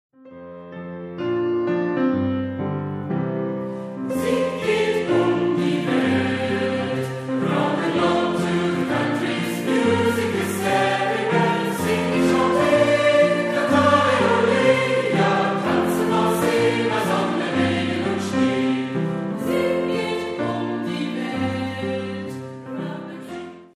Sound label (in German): Musik; Klassik